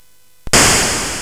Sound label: Space Gun